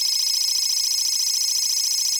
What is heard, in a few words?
text blips